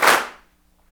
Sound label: CLAPS, Handclaps; Afr.Hands; Roland LCDP14 Africa VOL-2